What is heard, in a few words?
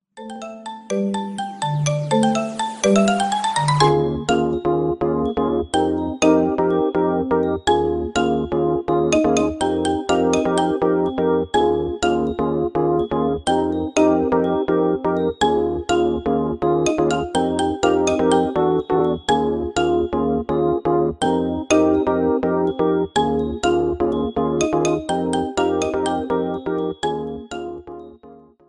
marimba ringtone remix